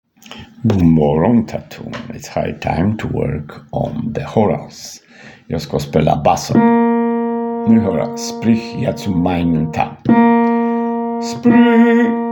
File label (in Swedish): Choral bas